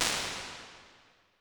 Nice Hall; Medium Spaces; Halls; Impulse Responses; Apple

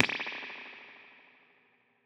Combo Spring, impulse responses, spring reverbs; Medium Spaces; Apple

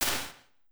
Vocal Ambience, Impulse Responses, Plate Reverbs; Small Spaces; Apple

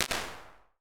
Refuse, Impulse Responses; Small Spaces; Indoor Spaces; Apple

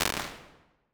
1.1s Auditorium, Impulse Responses; Small Spaces; Indoor Spaces; Apple

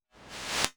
Backwards, impulse responses, warped reverb; Small Spaces; Apple

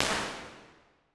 Big Theater, Impulse Responses; Medium Spaces; Indoor Spaces; Apple

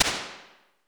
Warm Club, Impulse Responses; Small Spaces; Indoor Spaces; Apple

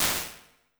Boom Room, impulse responses, small spaces, rooms; Apple

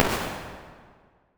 Tight Garage, Impulse Responses; Medium Spaces; Indoor Spaces; Apple

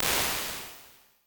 Compressed Hall; Small Spaces; Halls; Impulse Responses; Apple

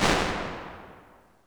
Dark & Small, Impulse Responses, Warped Reverb; Medium Spaces; Apple

